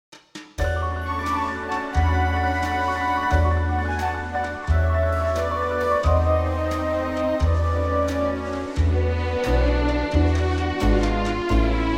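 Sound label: orchestra A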